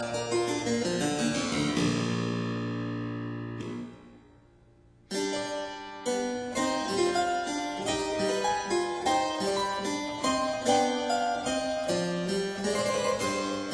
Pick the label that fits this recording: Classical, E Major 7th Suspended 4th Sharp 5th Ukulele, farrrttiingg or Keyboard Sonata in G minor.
Keyboard Sonata in G minor